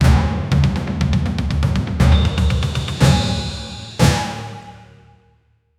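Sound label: cinematic drums dramatic; build up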